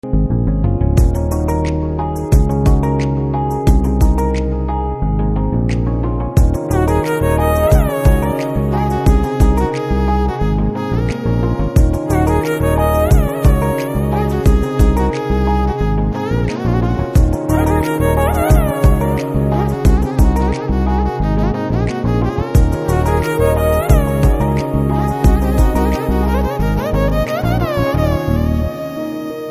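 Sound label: background music, Telugu Ringtone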